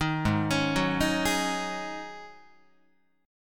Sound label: Ab 7th Suspended 4th Ab down Guitar; Ab7sus4 arpeggiated